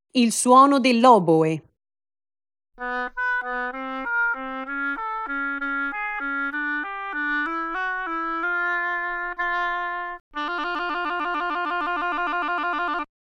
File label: oboe